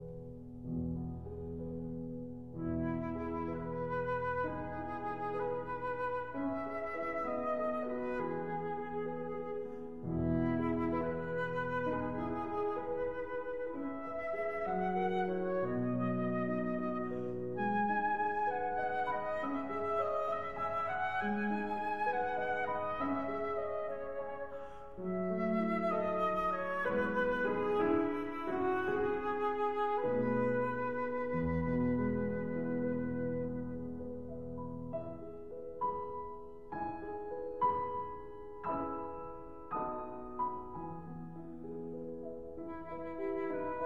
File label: Berceuse (Lullaby) for flute and piano